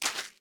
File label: Footstep gravel